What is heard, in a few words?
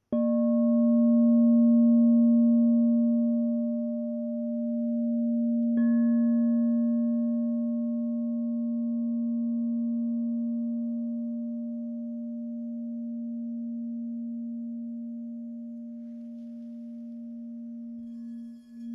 Crystal Tones Singing Bowls